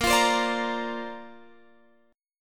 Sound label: Bb Major Add 9th, Guitar, Open F; Bbadd9 strummed